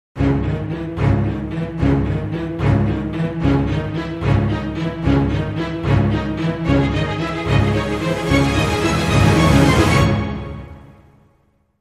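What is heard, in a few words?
Dramatic Sting, No Percussion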